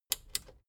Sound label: plastic button small latch click enable slow